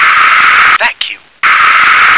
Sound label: vacuum